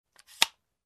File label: Gun clip load